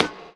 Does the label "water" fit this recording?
No